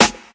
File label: high quality perfect steel snare drum sample f key